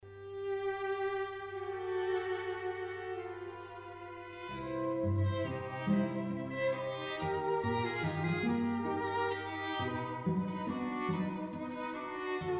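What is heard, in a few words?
string trio; rontgen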